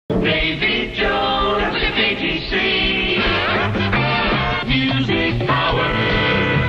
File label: jock jingle